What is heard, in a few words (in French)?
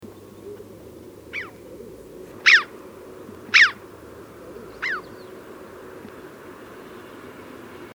crave à bec rouge